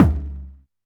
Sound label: DM5 Perc, Alesis DM5 drum machine